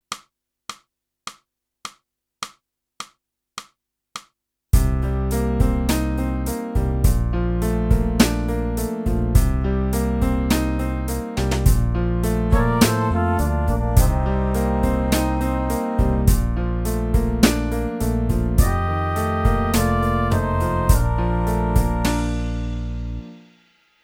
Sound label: sax